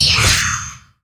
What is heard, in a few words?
devil fairy attack; ubaid; Akeron